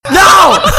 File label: pain; vanoss